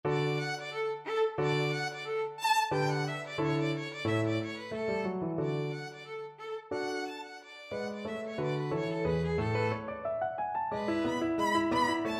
sonatina violin

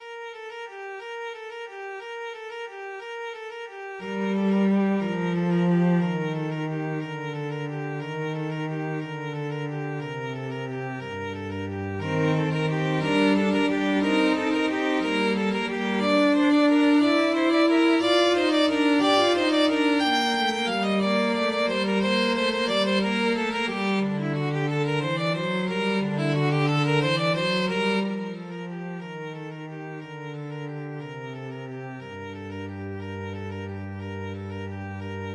violin cello duet

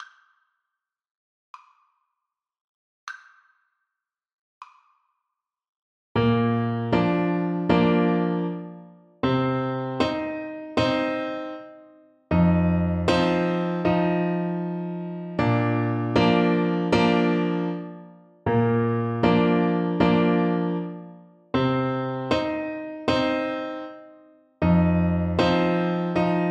Trombone

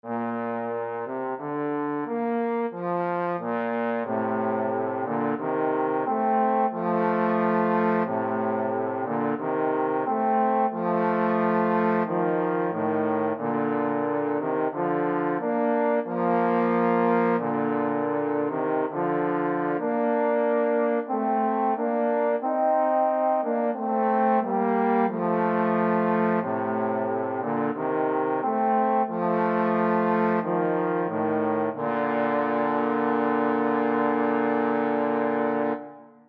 trombone duet

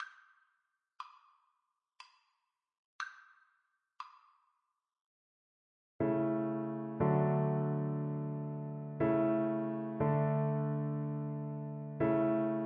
baloo lammy, cello

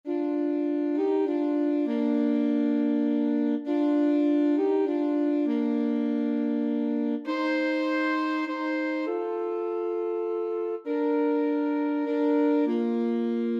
alto saxophone duet

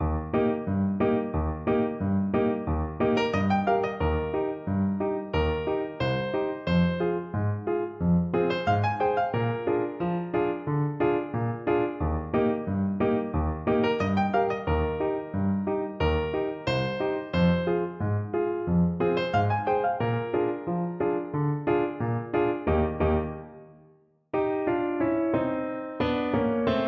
sortie, Flute

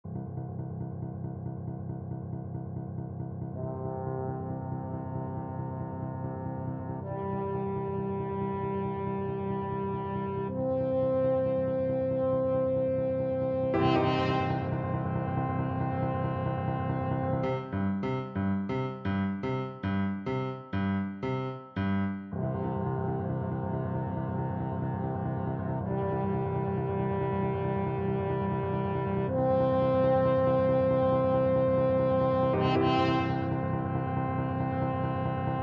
also sprach zarathustra, french horn

